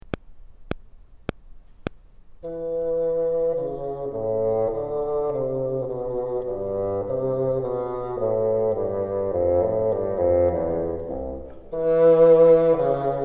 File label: bottom, bassoon